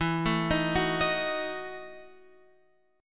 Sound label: E Major 7th Suspended 2nd Suspended 4th E, Guitar, Open F; x,11,9,10,9,10; arpeggiated